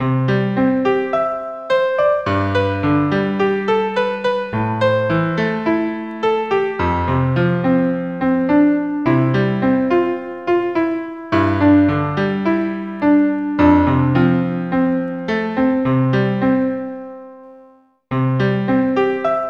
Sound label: Melody